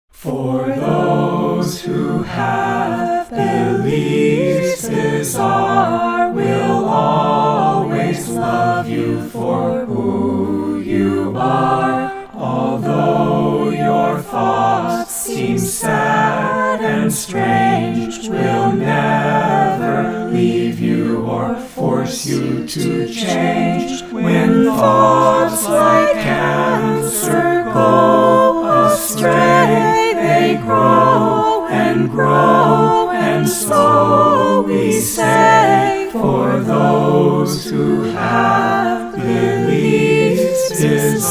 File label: SATB; For Those Who Have Beliefs Bizarre